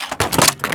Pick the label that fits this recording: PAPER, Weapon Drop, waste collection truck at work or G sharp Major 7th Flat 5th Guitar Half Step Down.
Weapon Drop